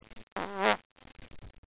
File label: fart